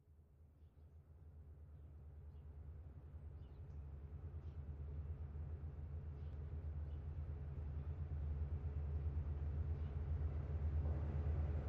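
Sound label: Steam Train By Low Distant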